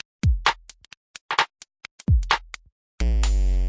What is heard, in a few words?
clicky offbeat blart, drum loops and breaks